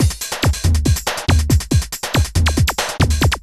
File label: techno 140